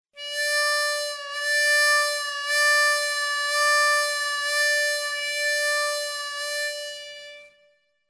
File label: bend, harmonica